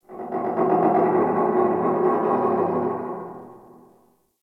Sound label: Pipe Creak One Off; Ambience; Warframe